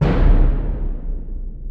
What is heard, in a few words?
orch bassdrum